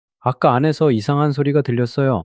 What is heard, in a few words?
surprise